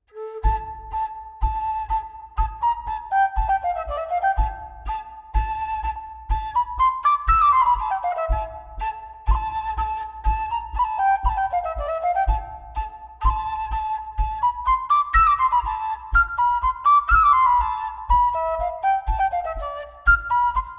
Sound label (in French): Bourree d Auvergne; Alkan